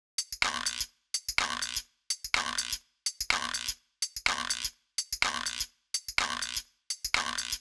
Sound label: Tr Gu Loop 125; Equipped Music; Loungin House; Perc Loops 125